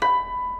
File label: harmonics